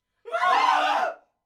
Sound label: Scream Group Short